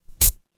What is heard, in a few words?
feedback confirm